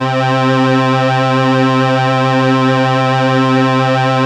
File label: SIEL, String Machines